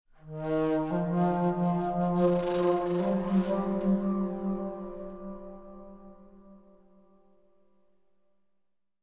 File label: pipe; Myst III Exile